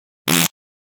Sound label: Fart Foley Short And Healthful; Hollywood Edge; sound designer's toolkit v2